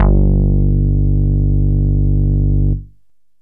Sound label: synth bass